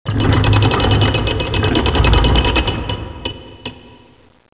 chain; CYPHER

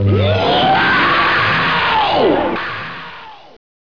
woooow; After Dark 2.0 Modules

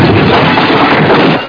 Crash; Super Maze Wars; Arcade

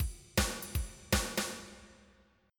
swing

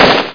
usp; Spec Ops 2 MPlayer Demo

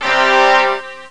Bagpipe

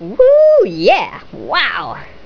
wooyeh

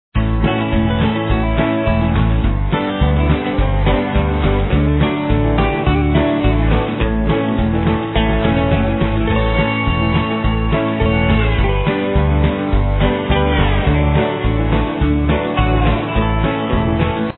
bgsound; rodeo

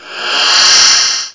jud breath

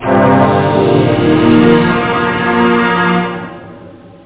suspense; Sounds Terrific II; Weird Science; Amiga

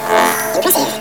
imp

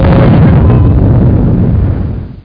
explosion; Armes & guerre

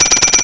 shaken bell, percussion instruments; Sound Effects for Multimedia - Volume 1

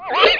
swirl

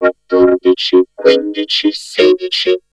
Vocode, Vocal Samples

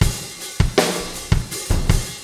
drm

